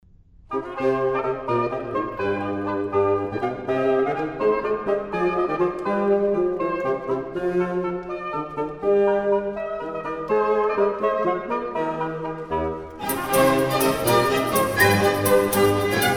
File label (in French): Concert pour quatre parties de violes, Gigue anglois; Charpentier; H. 545